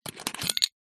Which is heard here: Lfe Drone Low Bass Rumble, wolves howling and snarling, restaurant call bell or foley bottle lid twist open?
foley bottle lid twist open